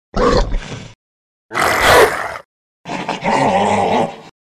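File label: werewolf growl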